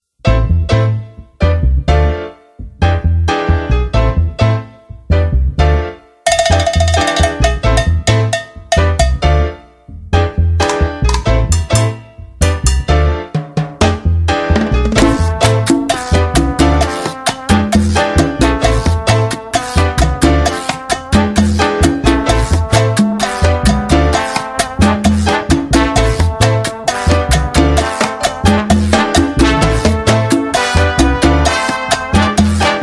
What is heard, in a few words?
cha cha cha